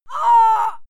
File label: female fight groan pain; schreie; menschen